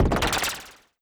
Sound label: strengthen